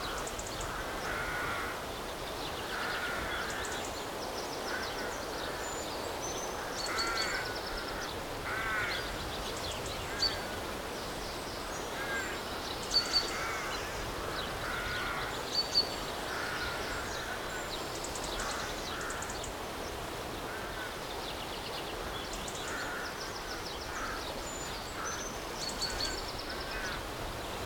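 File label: Scottish farm dovecote; the 39 steps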